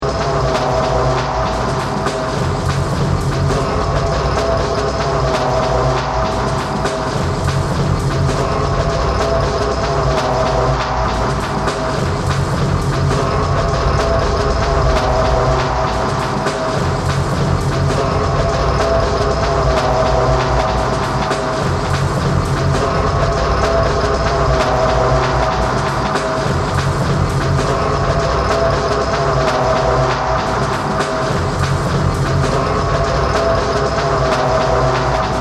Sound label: Liquid Drums